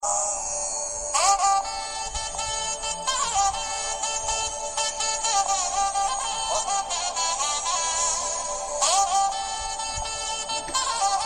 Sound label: violin